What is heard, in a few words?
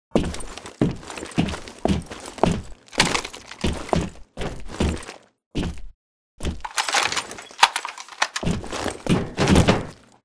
weapon toss background; airbase; Titanfall 2